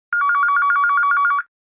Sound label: Modern Calling, ringtones; Fujitsu F-42A